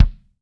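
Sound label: bass drum, Drums Acoustic; AKAI; 90s sample CD; USB Soundscan vol.10; RAW KIT 5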